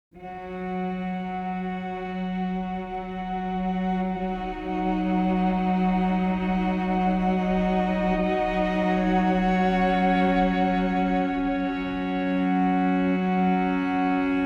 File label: Cello sustains